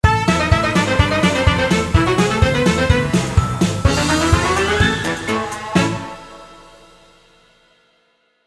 Huge Win; Beat the Bobbies 2

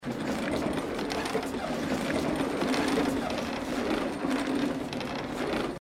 reel spin; Lucha Rumble

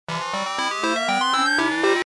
nudge long; Step Back 7's; nudges

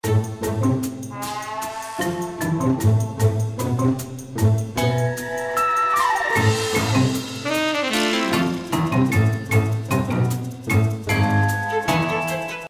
Music; Beat the Bobbies 2; FreeGamesSelector

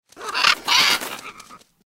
fly past; tiki-beats

